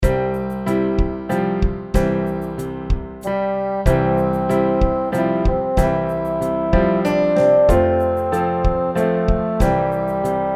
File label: Amazing Grace, ukulele; Rainbow Ukulele